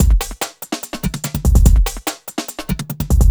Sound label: kika 145